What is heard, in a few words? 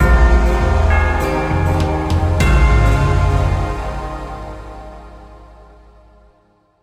Hallowed Victory; Rook's Keep Online